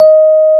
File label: D#4, Electric Pianos; Wizoo Electric Pianos